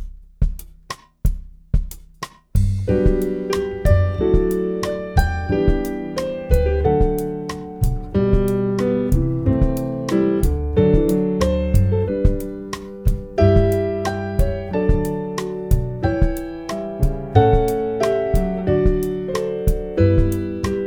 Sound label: Variations, for keyboard